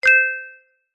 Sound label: multimedia notification bell chime ping tone